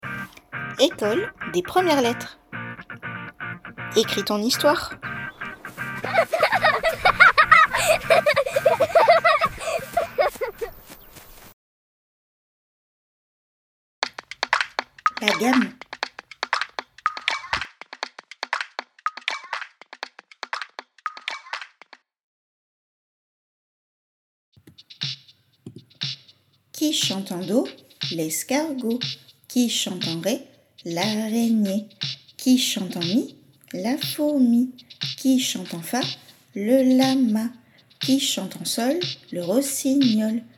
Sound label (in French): La Gamme; comptines maternelle 4 ans